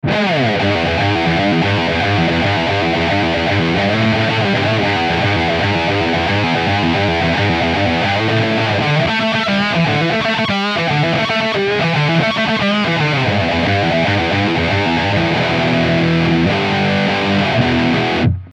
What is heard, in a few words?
Lead; Evil Eddie; Canal lead, Fat 5